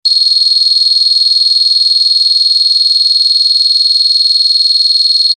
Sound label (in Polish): Ton